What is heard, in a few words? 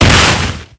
Object Snowball; BOMBERGIRL RAINBOW; Arcade